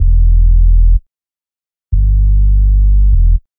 DEEPHOUSE BASS 125 A

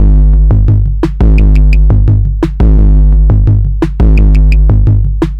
BD 87, loop

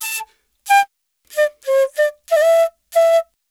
ETHNO EXTRA 90; ethno2

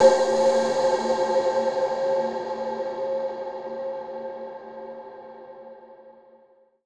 TECHNO FX 140

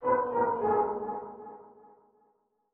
ethno, 125, A

